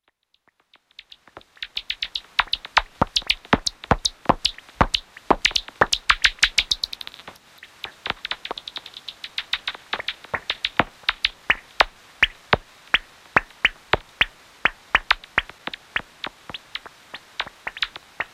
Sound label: Noctule, bat